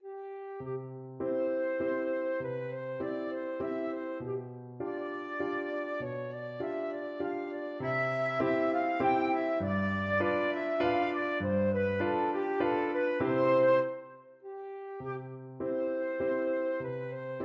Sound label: german dance, flute